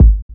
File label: Kick; Street Anthems Sound Kit